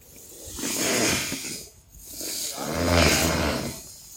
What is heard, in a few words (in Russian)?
pri stroitelstve tyanut stalnoj prut